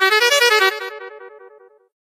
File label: flying carpet, Sony Xperia Z3 notifications